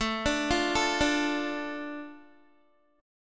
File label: A Suspended 4th Sharp 5th down Guitar; Asus4#5; arpeggiated